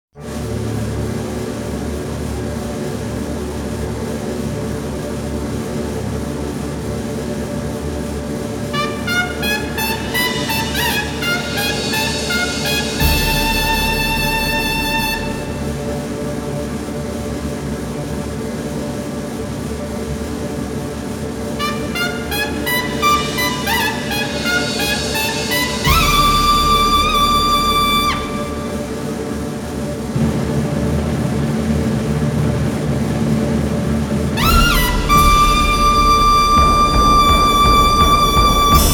fanfare; Kiba

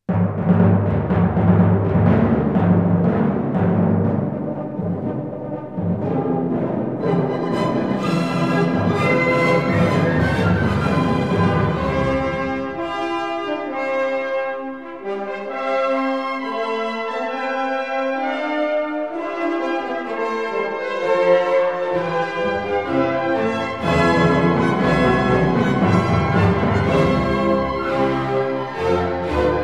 Seventh Symphony fifth movement; Night Song (Mahler); Legend of Galactic Heroes; Galactic Empire SIDE

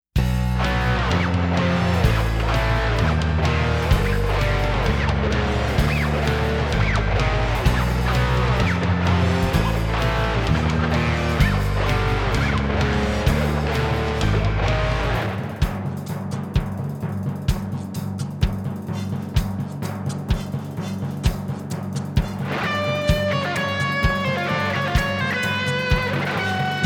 fast tempo, rythm only; Neon Genesis Evangelion; Evangelion S2 Works

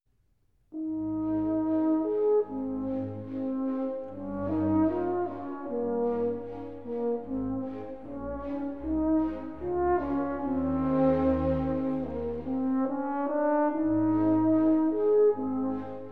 Horn Concerto No. 3 second movement; Mozart; Legend of Galactic Heroes; Galactic Empire SIDE

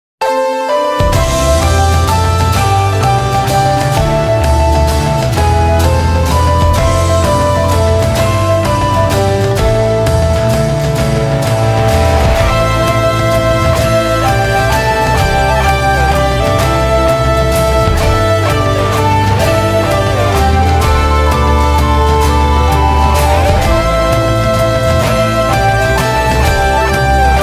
Instrumental; Twelve Kingdoms; Image Soundtrack

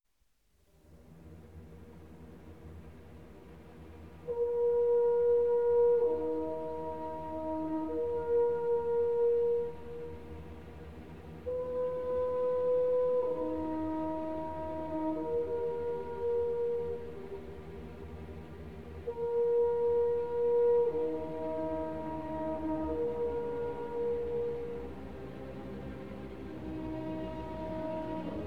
Symphony No. 4 first movement; romantic (Bruckner); Legend of Galactic Heroes; Galactic Empire SIDE

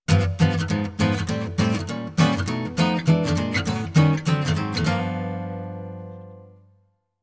Jingle JAZZ; Futakoi Alternative

